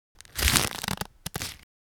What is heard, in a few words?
paper; 紙を握りつぶす